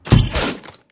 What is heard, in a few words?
Silenced Shotgun Gunshot